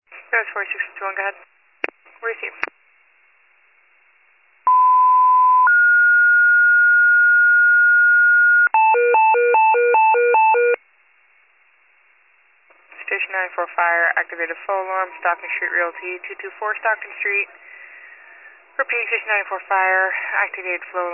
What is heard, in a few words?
fire, pager alert